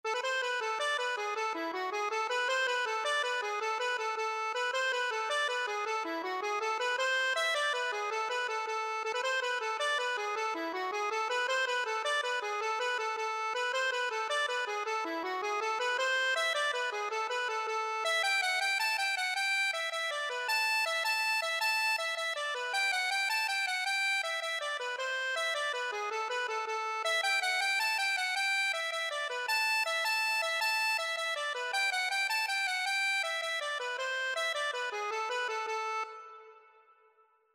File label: jig, accordion; kilkenny